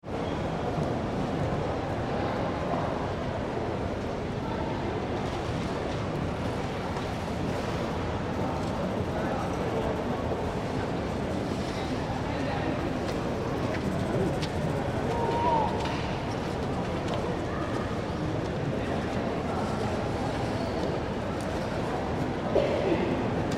Shopping Mall Crowd, Big ambience - some footsteps